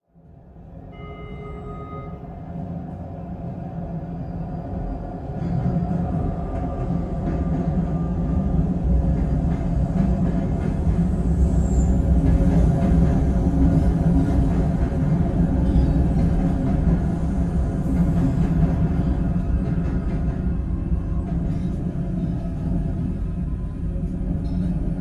subway; The Sims 3; windows gamerip 2009

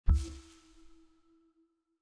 Desert SFX, Flower Bounce Small E

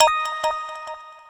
Notification Chime

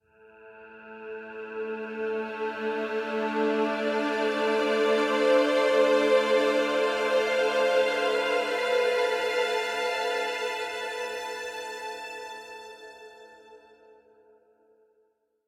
Stingers - Sad; Planet Alpha

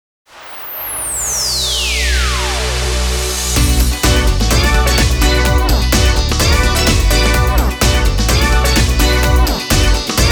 karaoke; Hakurei Jinja Reitaisai 9

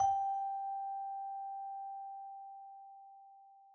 Scale; Second Scale7; tintin reporter cigars of the pharaoh

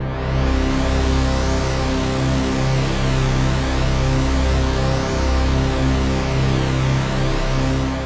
Beat synth drone; Vostok Inc.

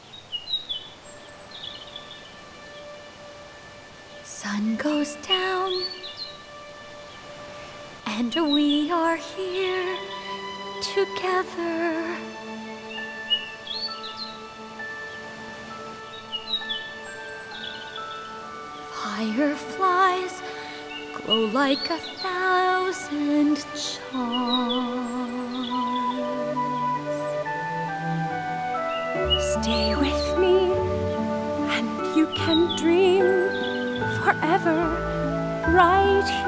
Lullaby; Barbie as the Island Princess; PS2; Wii

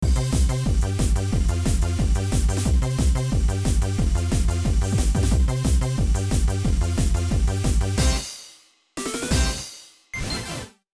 Remix Tempo Up; Rhythm Tengoku

